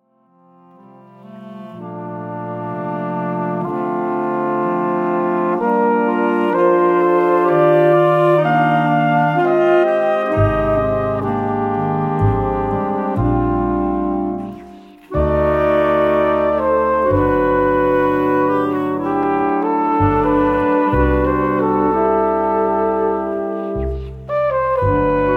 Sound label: Jazz Ensemble